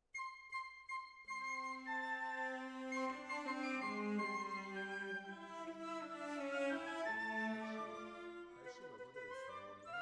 Decca, Jazz for piano

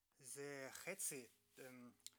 Tape GTR

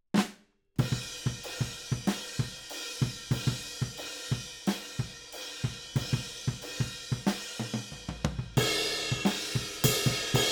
Ride

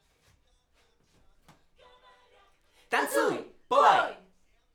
Backs AKG

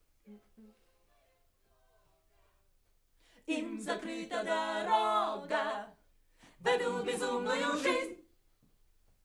Backs; SCHOEPS

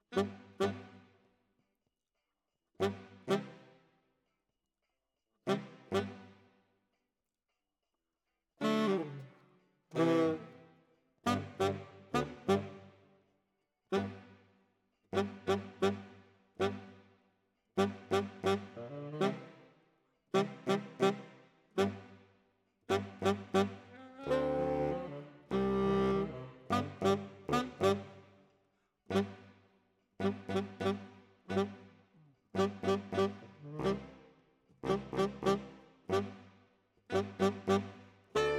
Tenor, RNR for piano

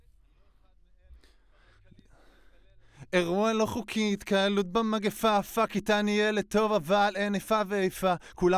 Voc

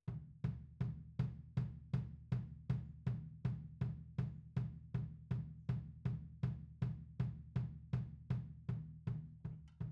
Tom, 160 BPM

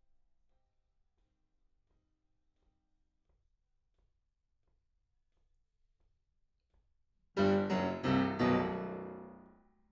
PIANO

